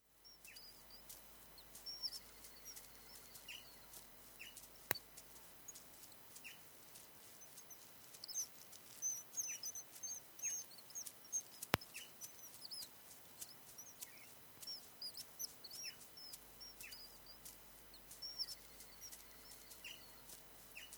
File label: nature